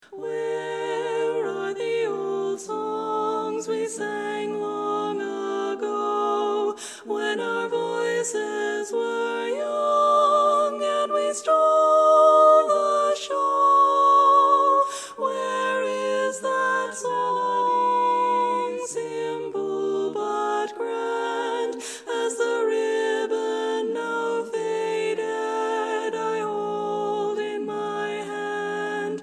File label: Old Song Tenor